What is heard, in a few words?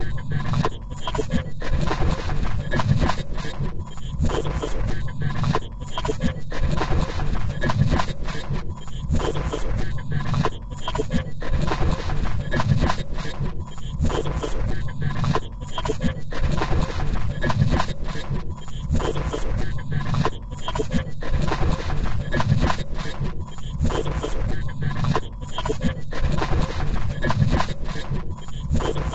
Bgm slow